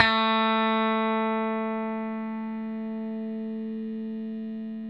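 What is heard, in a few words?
electric guitar sus A4 left; Sampleheads; Whole Lotta Country 1 & 2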